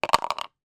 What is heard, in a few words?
leisure board game dice x1 put in shaker; yahtzee